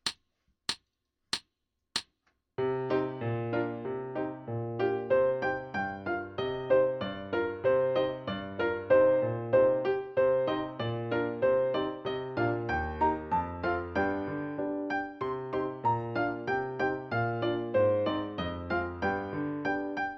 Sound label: Dance-Slow tempo with Solo, Flute